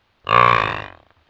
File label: Yak Calf Grunt